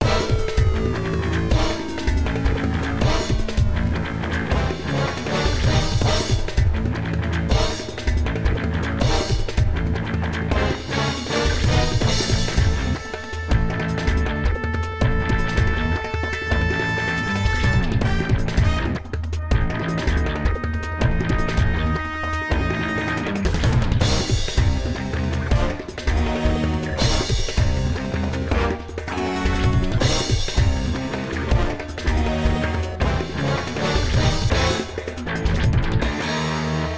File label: Speed Lasers